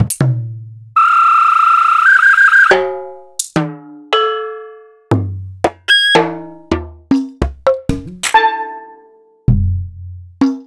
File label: EMU Planet Earth